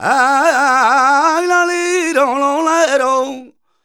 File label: voc c, Flamenco Vocals Male Cantaor; Best Service ProSamples vol.46